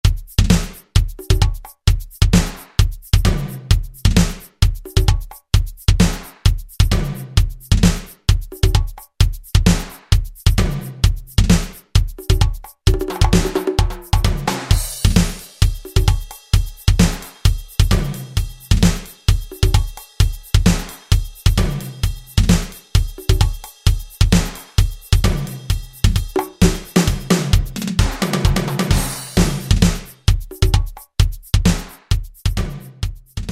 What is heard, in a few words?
Latin, Alesis SR-16; Preset Patterns